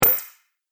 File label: Android Pneumatic Move, robot; Robot Sound Effects